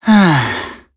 Sigh

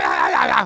Fight; Neighbours From Hell Console Edition; GC, Xbox

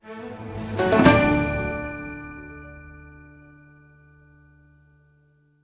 stinger Chest Opened

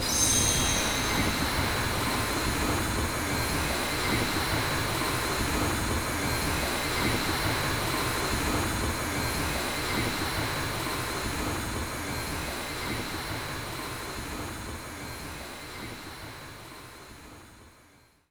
ball Roll Loop

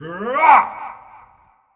Effort Long; Marv

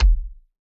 Mech Footstep Stomp; StyxMech

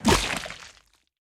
Slime Explode; Going Under

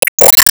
Plasma Hit; disney star smash android ios mobile gamerip 2020

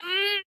Overhead Wind Up; Jackie

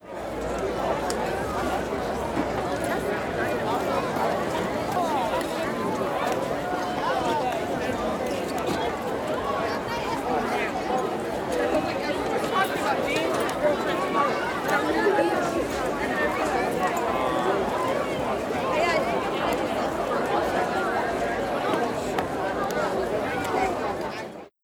Vatican city Walla

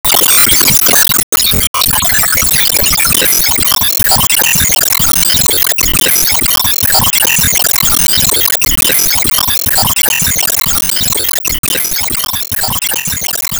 Enemy Candledevil Strongattack Loop; disney star smash android ios mobile gamerip 2020

